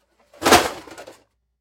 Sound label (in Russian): voin opuskaetsya metallicheskiy gul, zvuki dospehov